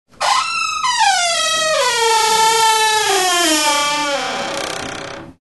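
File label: door sounds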